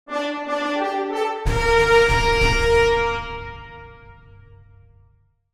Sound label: Video game success victory win epic